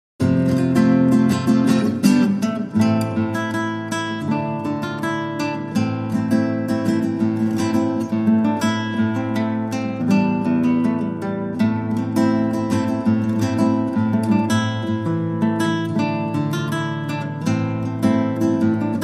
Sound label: Solo Guitar